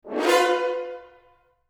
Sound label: rip chord